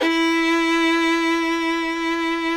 FIDDLE NOTES, E4, R

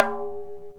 INDIAN PERC

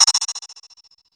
crash R; Zero-G; Chemical Beats; CRASH ZOO

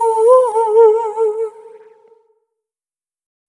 Single Voice Melodic Loops; Singles

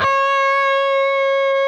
PRS D, Guitars, LEAD; Spectrasonics; Hans Zimmer Guitars VOL-1

